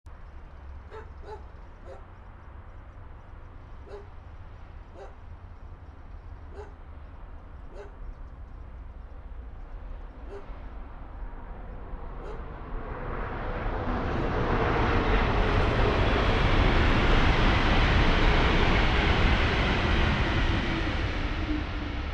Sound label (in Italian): Treno; Eigenmike; ComunePR; NuovaStradaF